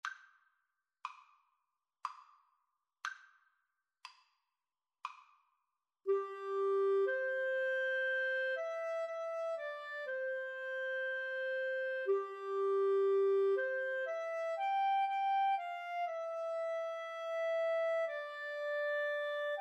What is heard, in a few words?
clarinet guitar, Guitar-Clarinet Duet